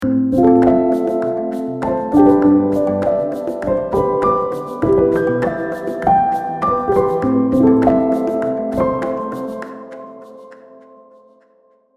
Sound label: softpiano